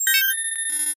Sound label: Computer Button Key Swi; communications